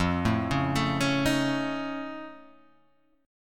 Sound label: F Augmented 7th F Guitar Half Step Down, chord